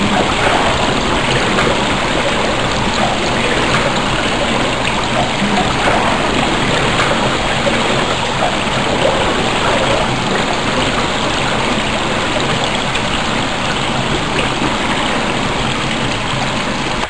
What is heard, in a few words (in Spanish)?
AGUA; vitalis